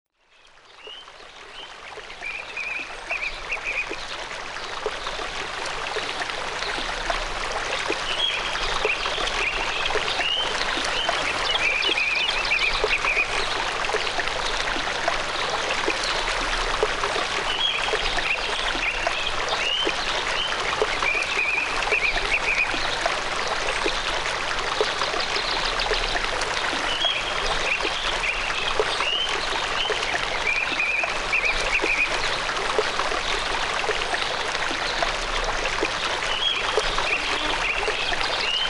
country

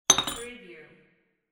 coffee cup

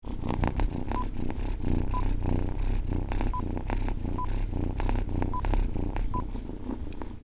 Kitten Purring

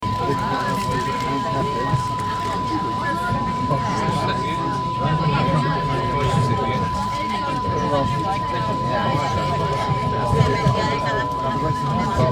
Late Night Train Murmur

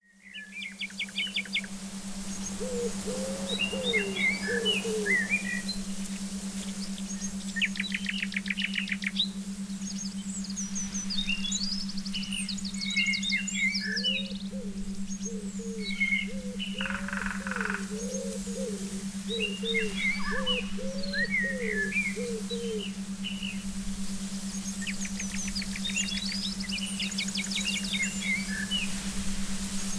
autumn breeze

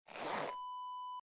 jeans zipper

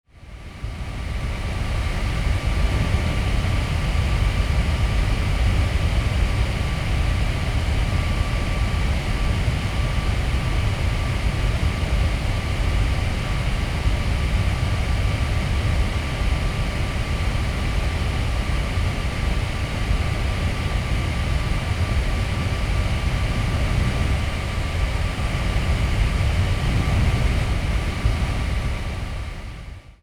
Calming Electric Fan

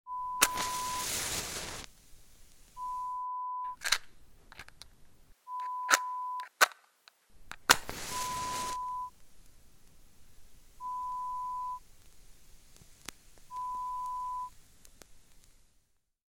Match Strike sound

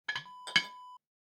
ceramic jar open

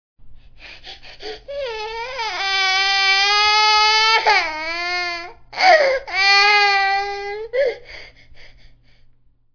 crib cry

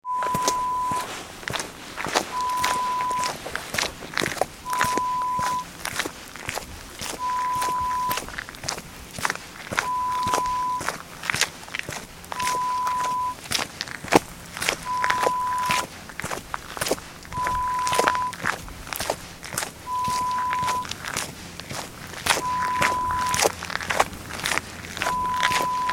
Footsteps WOODLAND

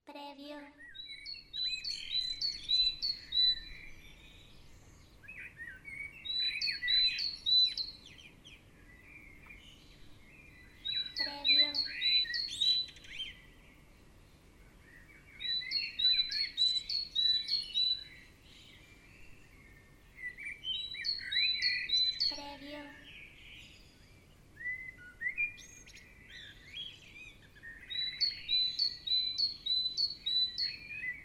Birds Morning Ambience

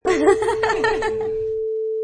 Ladies Giggle

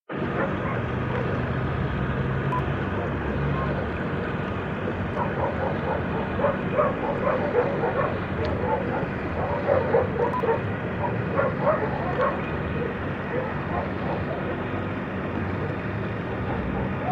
Dock Ambience

